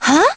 huh; uzuki

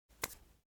hands clasping

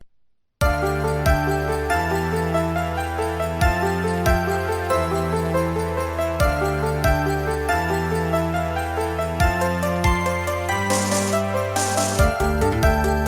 questioning allegro; jfa

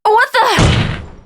faceplant

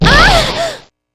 moan; p4u; aigis

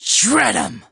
shred; p4u; adachi

